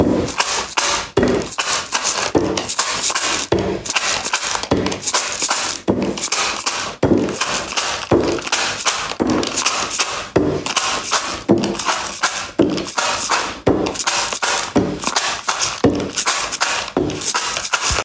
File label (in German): bumm cha cha